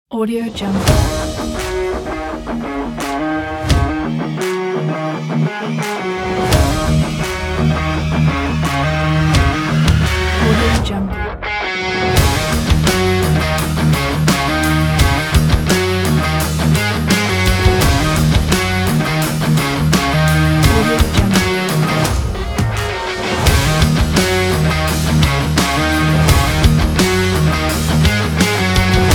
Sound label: hard rock intro trailer; audioera